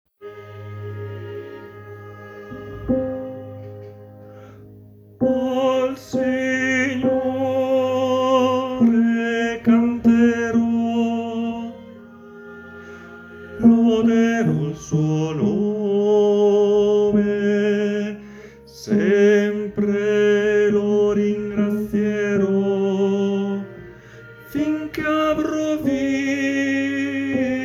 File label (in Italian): Tenore; Al Signore Cantero